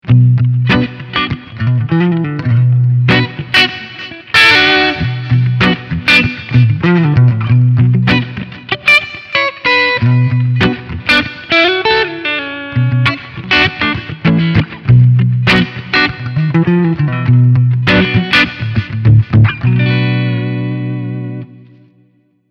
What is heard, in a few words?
Clean Front HB